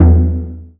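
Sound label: Malaysian PERCussion E1; USB Soundscan vol.60; Asian Traditions; AKAI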